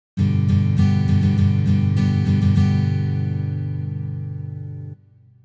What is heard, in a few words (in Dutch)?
strumming indierock ritme; strumming combi